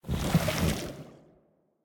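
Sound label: movement armor; smite; cthulhu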